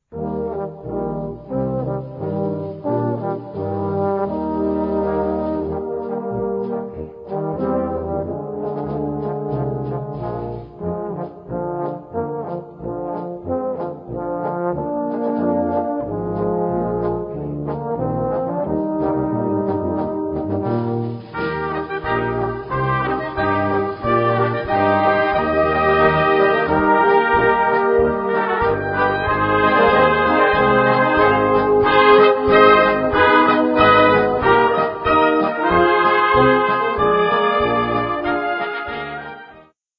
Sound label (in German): Polka